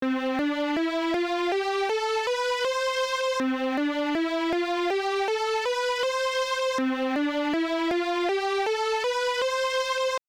Speaker Check; Fighting Layer; namco system 12 arcade

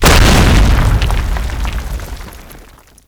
Explosions Explosion-Stone

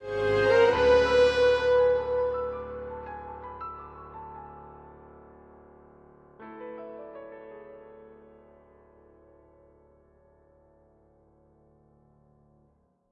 full horns; Sky Children of the Light gamerip

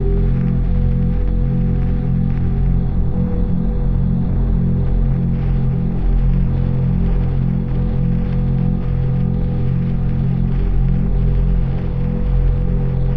Music Drone First Big Street; Battlefield 3

